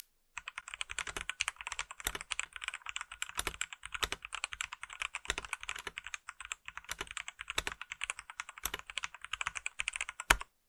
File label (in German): schwarz tippen